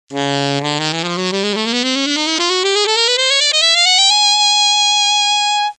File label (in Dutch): altsax range; blaasintrumenten